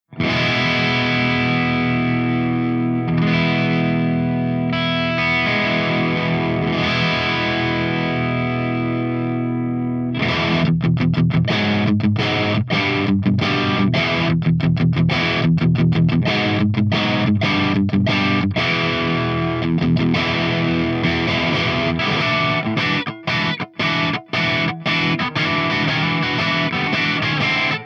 ENGL SOV CH2 CRUNCH V30 P90

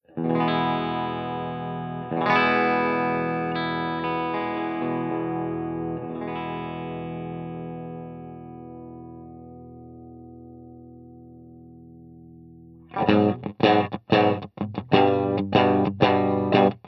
BUCKINGHAM NORMAL SC

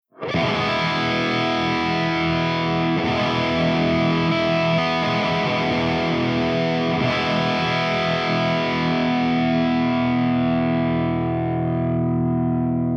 ENGL SP CH4 HIGH GAIN V30 HB

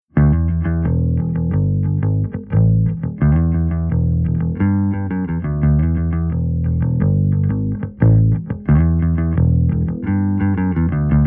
SVT FLAT

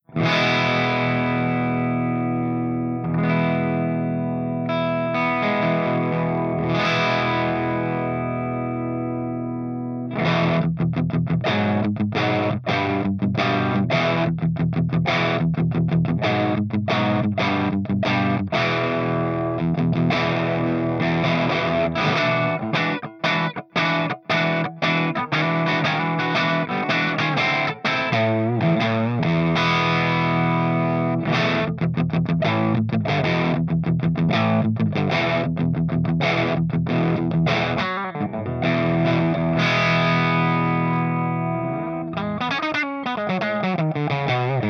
PLEXI CH2 GB P90

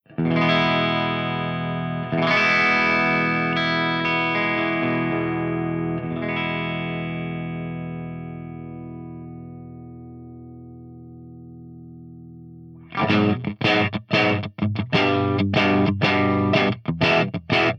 EVH5150 CH1 CLEAN V30

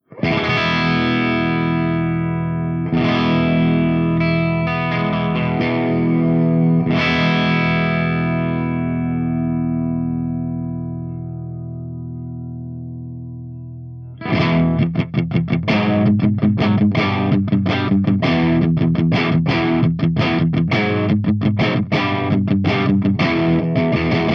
ENGLSP CH2BRIGHT V30 HB

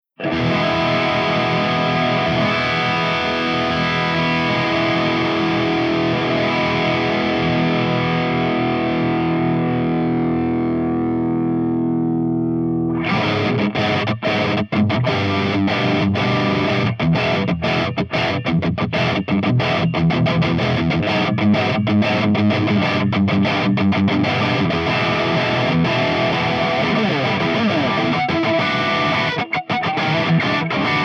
ENGL SP CH3 DRIVE V30

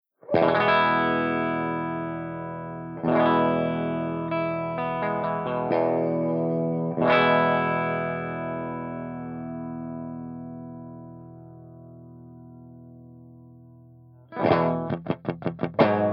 ROCKERVERB CH1 CLEAN V30 HB

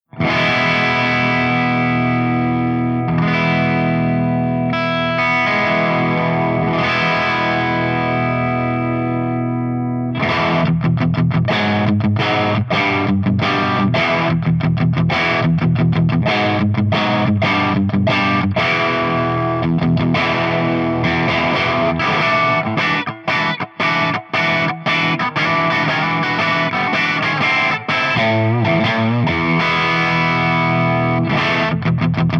ENGL SP CH1 GAIN BOOST V30 P90

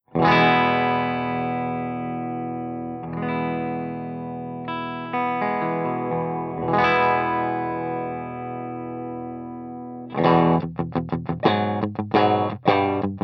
BUCKINGHAM NORMAL P90

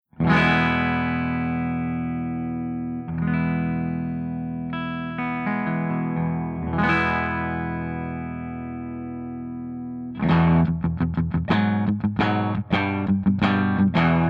FENDER SUPER REVERB BRIGHT REVERB P90

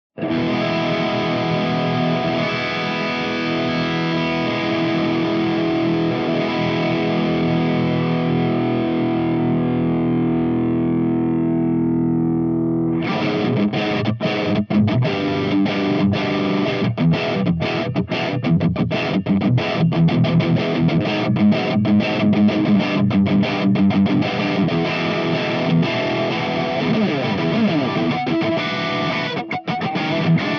BLACKSTAR HT CH3 USA V30

